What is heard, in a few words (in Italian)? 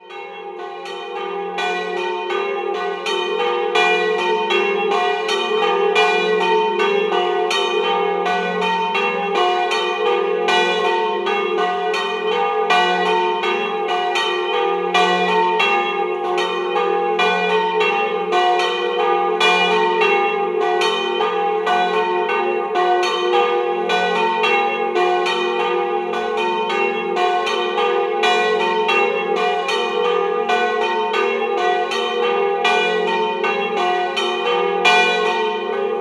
Orcenico Inferiore, campane